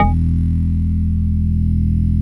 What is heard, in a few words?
METAL B